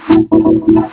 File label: tekkno